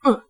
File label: grunt; platformer